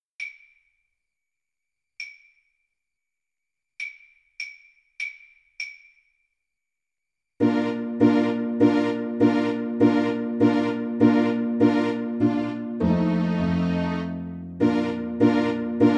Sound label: clarinet; german dance